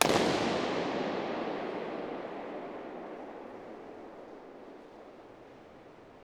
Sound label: Pool